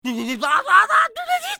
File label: Pig fear; bad piggies; android; mobile; windows